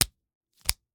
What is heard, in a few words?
Slap bracelet, smack, slap, whip, felt, plastic, hit, hold, continue; Eiravaein Works; Vaeyan IV